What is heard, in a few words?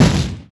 golem move; stalactic